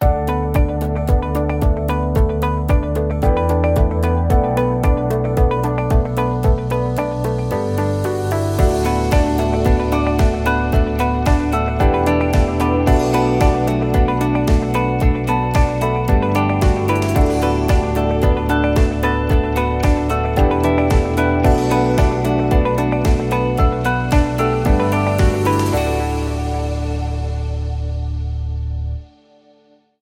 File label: Ambient Landscape; MIUI12